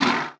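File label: hungry wolf damage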